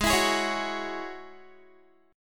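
A flat 7th Suspended 4th Sharp 5th A flat Guitar, chord; strummed; 4E,4A,5D,5G,5C,6F; 16,19,18,18,18,x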